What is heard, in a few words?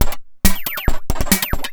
percussion loops, Techno; PS-45 WAV Techno ID